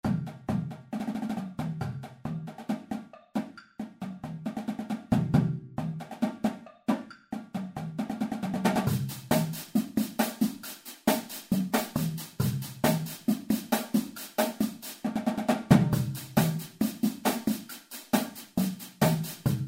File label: drumline